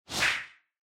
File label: Hand Synth Clap